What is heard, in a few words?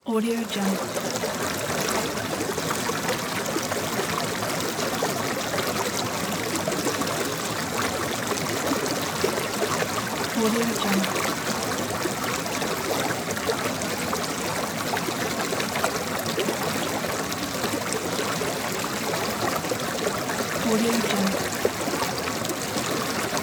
a river flow